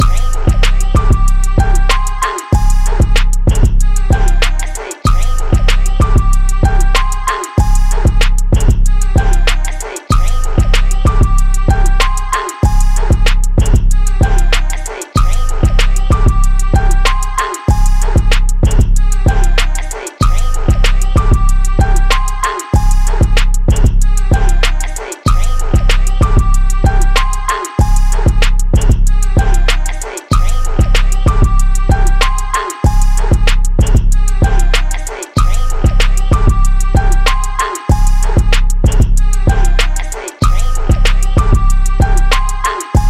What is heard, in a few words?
Freestyle instrumental